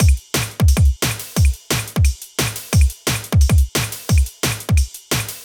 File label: DnB Skip it 176bpm